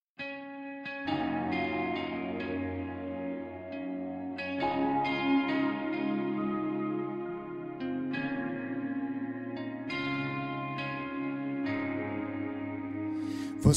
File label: trap; Fabrica da Musica; Curitiba